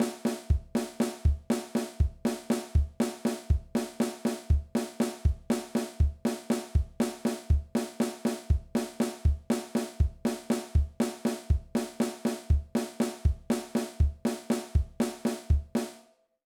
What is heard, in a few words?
Chop